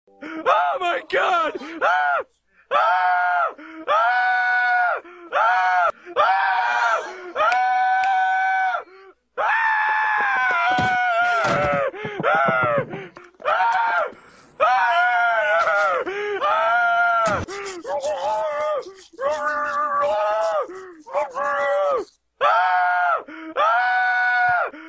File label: Screams; Homer